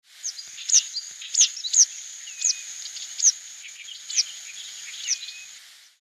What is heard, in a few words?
Yellow Wagtail flight call; Sri Lanka